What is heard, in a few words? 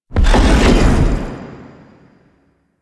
Teleport; Warframe; Zariman; Void Angel; Abilities